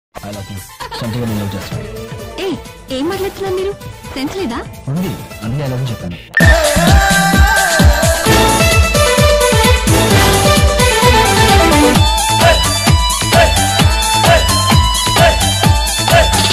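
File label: telugu favourite love background music song; idiot movie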